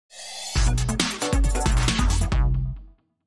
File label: Electropunk, Sting